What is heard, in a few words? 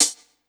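closed hi hat, 135 BPM; Ueberschall; Drum & Bass Resonance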